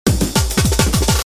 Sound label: Sample Loop